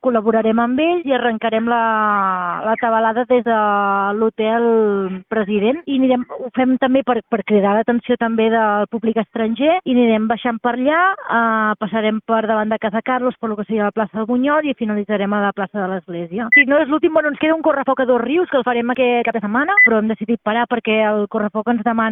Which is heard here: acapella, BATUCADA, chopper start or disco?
BATUCADA